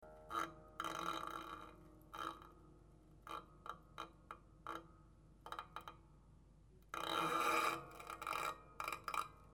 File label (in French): archet grince, harpe; touchers de la harpe